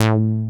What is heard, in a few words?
BASEMENT BASS; Masterbits; Dance Trax